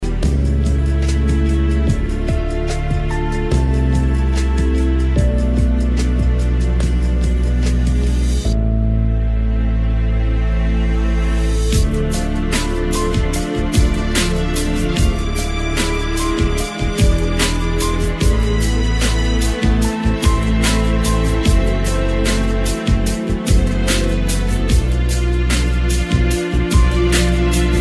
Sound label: Karaoke Song